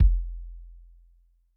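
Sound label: bass drum long, Kick, Yamaha DTXpress-II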